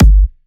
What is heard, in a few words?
128BPM Kick; Vengeance Samples; VFH1 128BPM Machine Kit